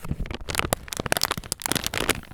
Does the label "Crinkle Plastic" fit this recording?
Yes